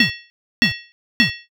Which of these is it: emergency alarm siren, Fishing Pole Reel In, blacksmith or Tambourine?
blacksmith